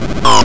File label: Skreech; Joust